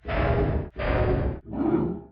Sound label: Hurt Voice; Wathom